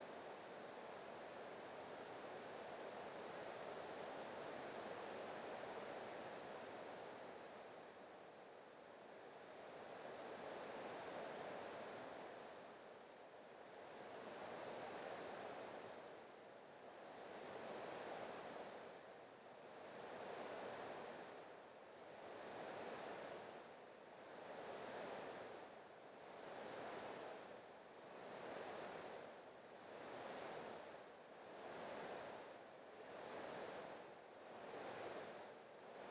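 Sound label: artificial nonstat noise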